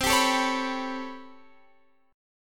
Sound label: A 9th down Guitar Standard, chordshape; A9/C strummed